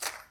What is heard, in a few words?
pickup